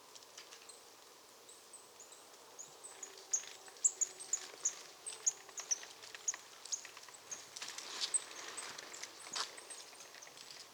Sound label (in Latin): Poecile palustris; SETE